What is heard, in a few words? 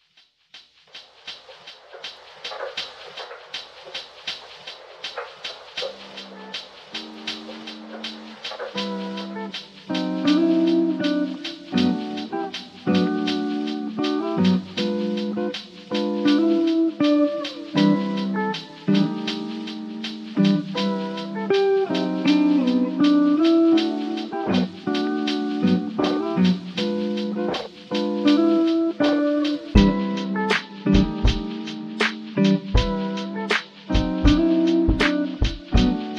lofi type beat slow days